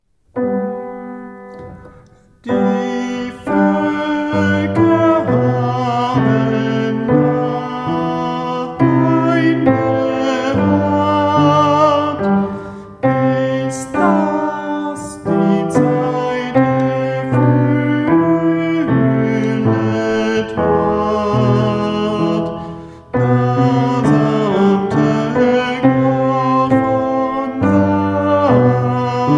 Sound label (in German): Tenor; Dies ist der Tag; adventsmusik